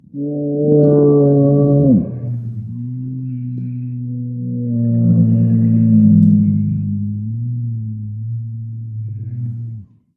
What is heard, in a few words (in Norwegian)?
Bjorn ringetone, Rovdata